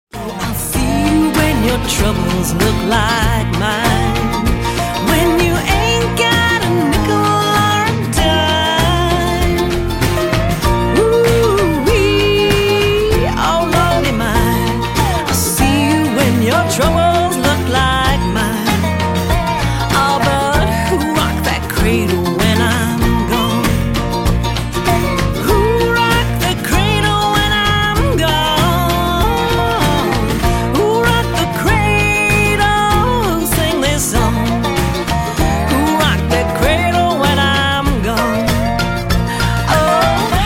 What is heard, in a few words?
Honey Babe Blues